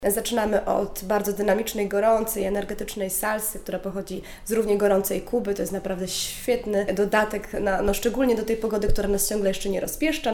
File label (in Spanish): salsa